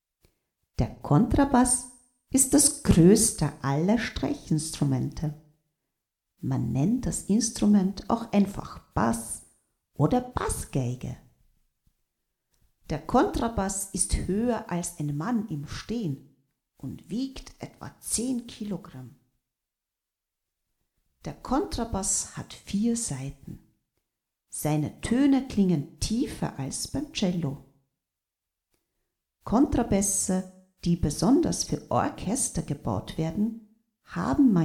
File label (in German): kontrabass